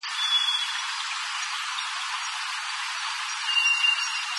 drozd pstry - Zoothera dauma; żyje w Azji; wroblowe; turdidae

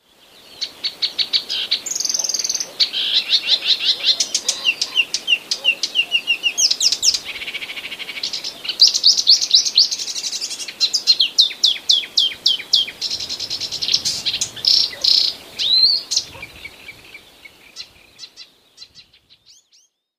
lozowka, łozówka naśladuje nawoływania cierniówki; Łozówka jest małym ptakiem śpiewającym; wroblowe; zapozyczenia

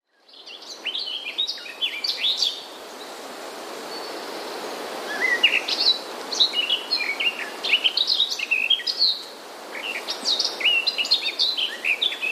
gajowka

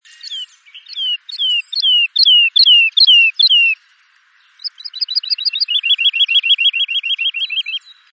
lerka - również śpiew godowy; wroblowe

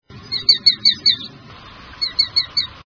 błotniak zbożowy

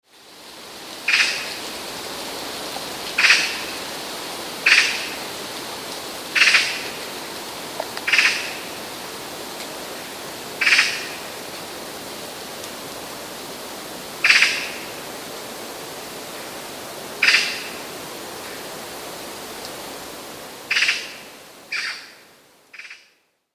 sroka, prawdziwa sroka; wroblowe; zapozyczenia

